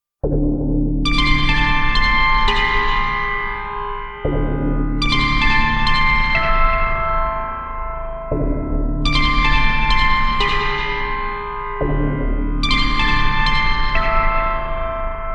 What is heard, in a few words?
Crazy Bells